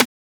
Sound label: Transient; FLPRO